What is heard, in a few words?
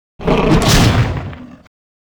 attack